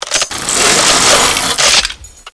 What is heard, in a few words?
minigun reload